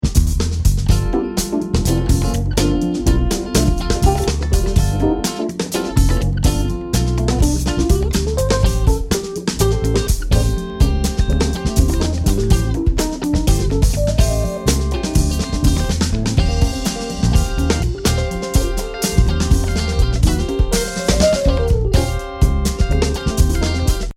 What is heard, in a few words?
start round, music